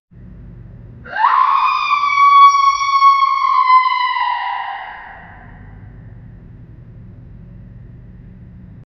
a distant echoing scream heard in a dark abandoned building